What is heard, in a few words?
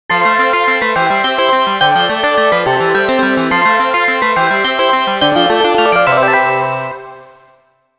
GAME OVER, soundtracks